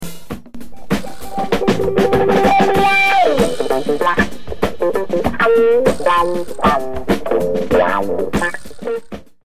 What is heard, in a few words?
Effect (Wah)